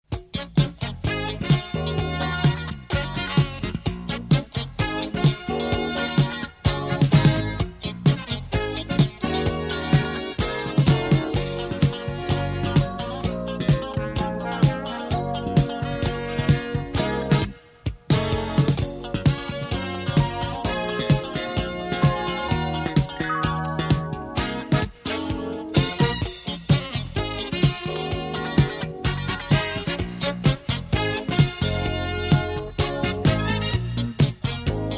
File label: MusicPad, Jingles; Radio Nova